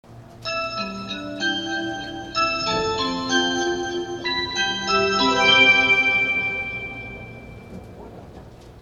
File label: chime; nankai